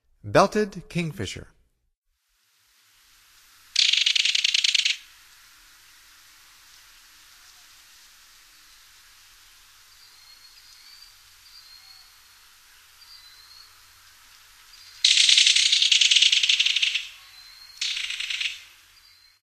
Belted Kingfisher, Songs of Birds of North America; Stokes